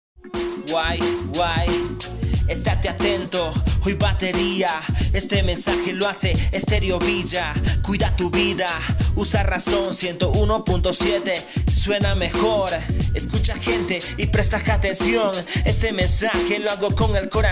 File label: JINGLE; NO SALGAS DE TU CASA